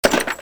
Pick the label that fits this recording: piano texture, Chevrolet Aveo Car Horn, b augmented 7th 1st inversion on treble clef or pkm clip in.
pkm clip in